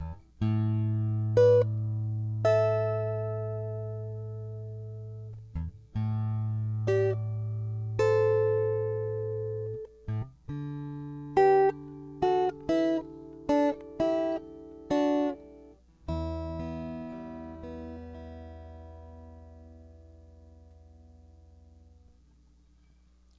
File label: Gaelic Lullabye Tune